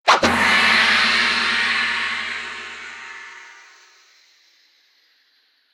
Matchend Victory; Boomerang Fu

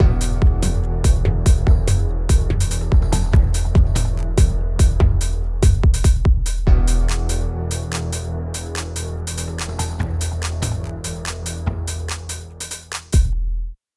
Music; Sifu

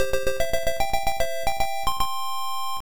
Correct Number Selected

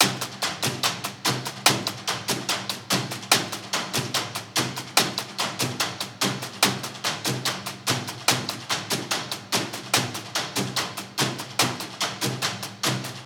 Starting Segment; Valley

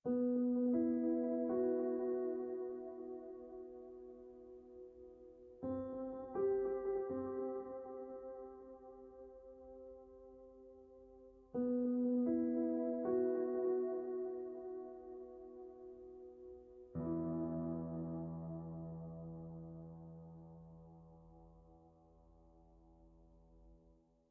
Piano Only; Cinematic Music Final; The Park

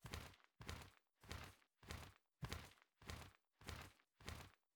Flap loop

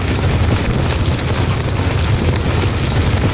fire large flames magic loop; SFX; Environment; Magic Spells; ProSoundCollection v1 3

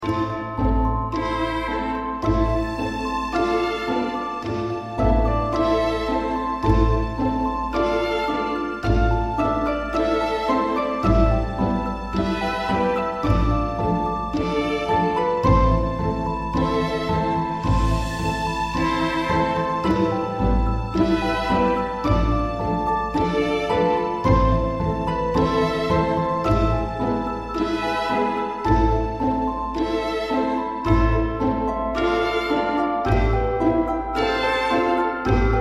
Puzzle; the da vinci code xbox 2006

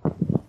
Player Walk footsteps mat Walk; SFX

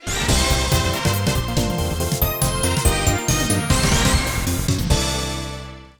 Game Cleared

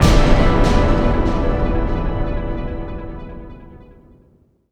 jingles; Virtua Quest

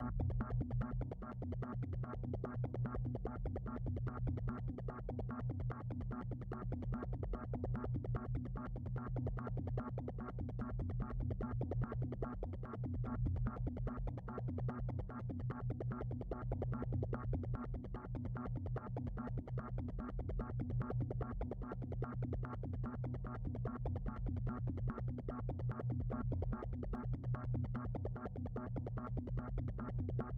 Drone Stem; DroneTut Loop; Sniper Ghost Warrior 3 2017

